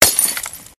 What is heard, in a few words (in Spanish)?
botella